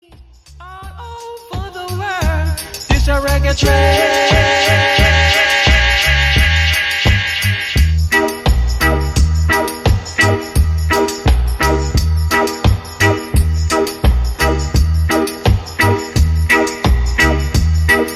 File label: Reggae Train Dub